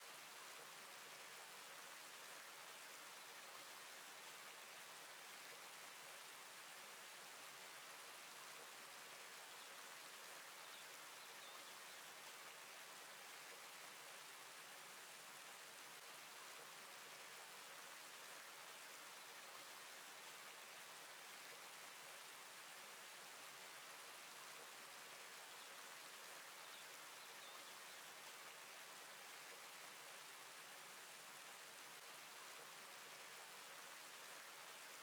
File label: Rainfall